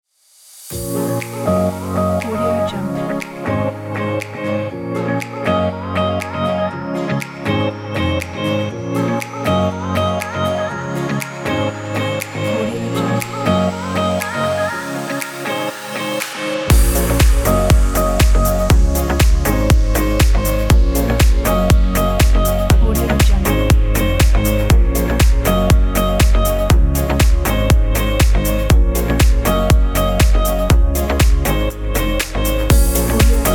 fashion dance party

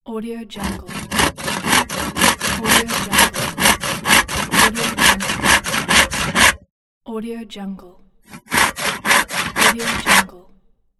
wood hacksaw

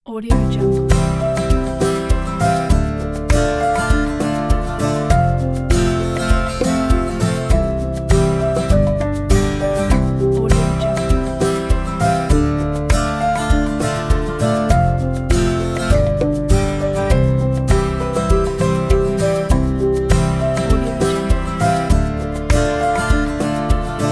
positive loop

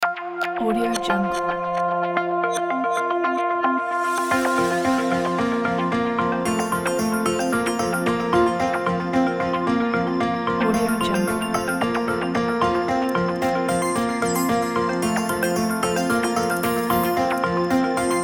corporate idea pack

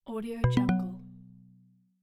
incorrect

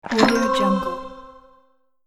pick up game item

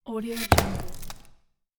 fall impact

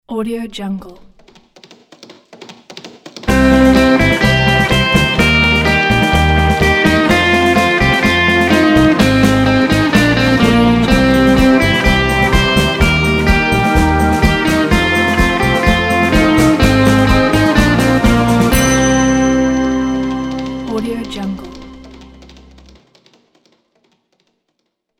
far west logo

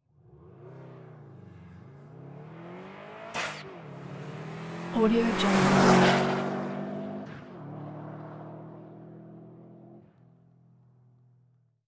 turbo racing car fast pass by with shift; hollywoodedge

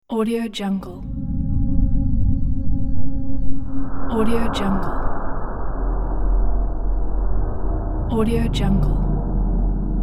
dark world horror; sonic-boom

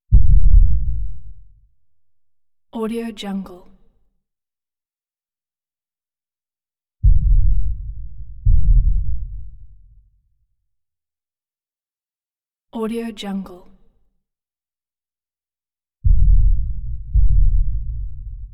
shotgun single and double blasts; prosoundfx

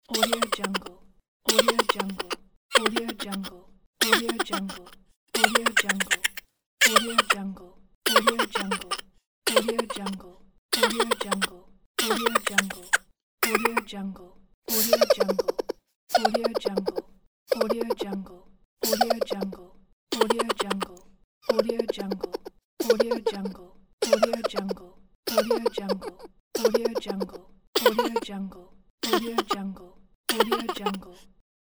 cartoon character giggle

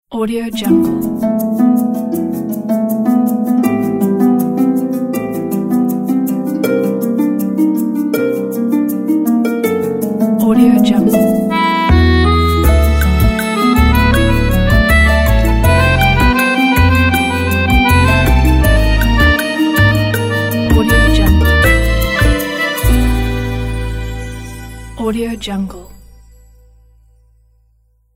harp and accordion ident

